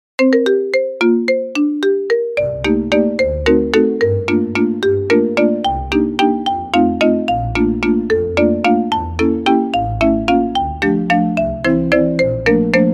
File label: Ringtone Marimba Merry Go Round; nhac chuong; Howls Moving Castle; Anytunz